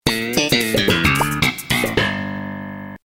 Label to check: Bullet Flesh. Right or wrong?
Wrong